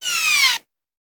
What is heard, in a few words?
Bullet Pass By; Sound Ideas; Guns